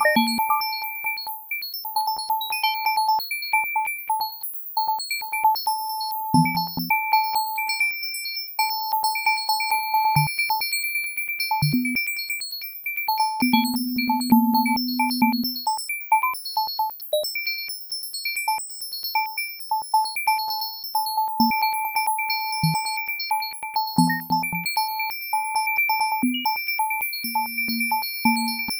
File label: chant high cling speed